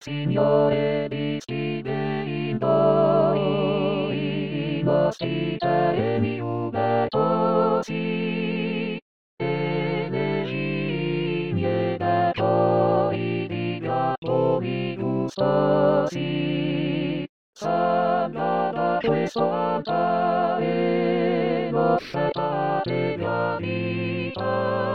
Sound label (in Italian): Coro